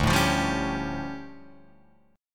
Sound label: D-Minor Major 11th, D, Guitar, Drop D